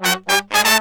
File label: 120 AM R, BRASS SALSA; Best Service ProSamples vol.14; AKAI